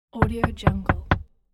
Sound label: door knocking